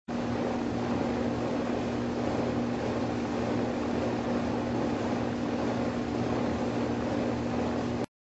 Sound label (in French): pompe à chaleur